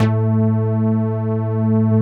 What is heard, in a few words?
PUNCH BASS